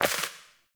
Sparrow Death State Impact; Animals

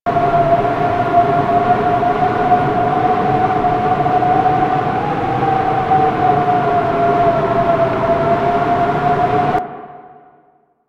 Wind Howl B; Warframe; Corpus; Ambience

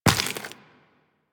Step Flesh, Footsteps, Walk; Warframe; Tenno

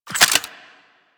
Glass Shotgun Reload B; Warframe; Tenno; Weapons

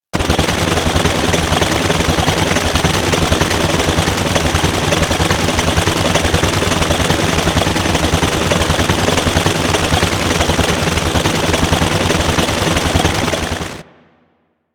Drone Hover; Warframe; Vay Hek

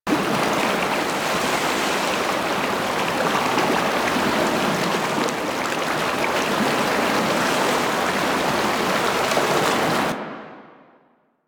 Water River Large Loop; ambience; props; loops; Warframe; Venus Open World; Venus Landscape

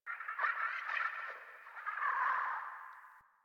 Landscape Mid Creatures, Ambience; Warframe; Venus Open World; One Offs

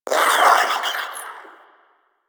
Proto Infested Maggot Awake, Enemies, Vocals; Warframe

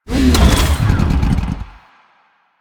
Dismount Moving, Motorcycle; Motorcycle Ninety Nine

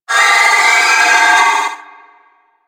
Trooper Attack Long, Vocals; Warframe; DE; Sentients

